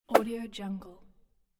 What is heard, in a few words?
whoosh